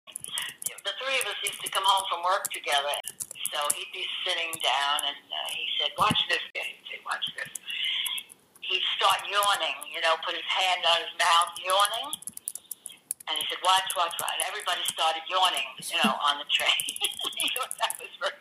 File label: yawin yawin yawin